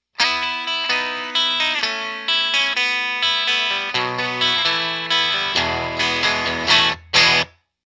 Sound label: tone attack riff low cut treble boost